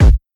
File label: rich lows kick drum one shot b key